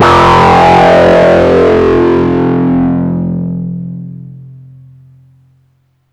OB-6 crush, Synths

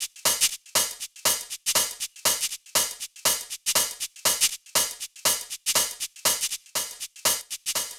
Hh Sh Loop 120; Equipped Music; Loungin House

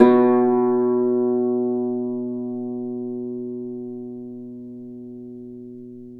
Celtic Harp, Plucked Strings

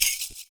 FOOT PERC; Univers Sons - Raricussions

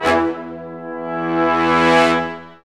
BRS, Lrg Ensemble; Roland L-CD702

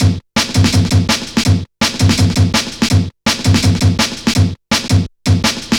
Drum Loops, Jungle

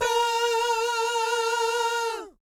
VIB FX, Vocal Planet Jazz & FX; ILIO; JAZZCOMBO

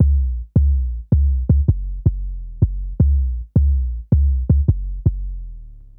Boom Bass, Drum & Bass; Fluid Dynamics

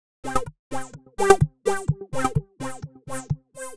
Ghetto Tech, Synths; Sonic Foundry (Sony Creative Software); Underground UK House & Electro

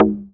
perc mod thuum

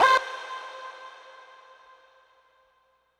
Synth C, Synth Prophet One Shots; Electronisounds

